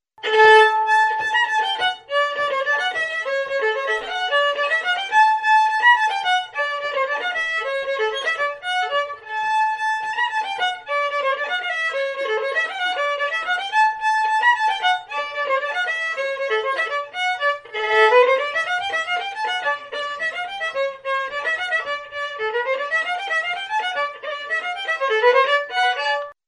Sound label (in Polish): tramla